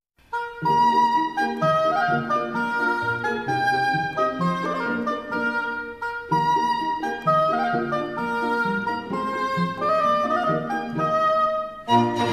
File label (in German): oboe